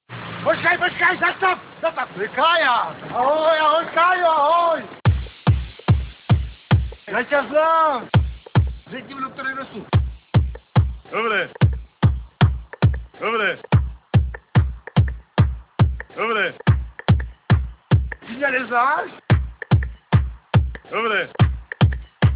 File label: trance